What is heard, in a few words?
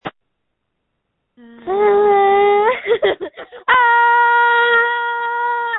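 Scream, phone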